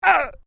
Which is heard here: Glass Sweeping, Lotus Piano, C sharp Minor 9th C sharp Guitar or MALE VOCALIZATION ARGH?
MALE VOCALIZATION ARGH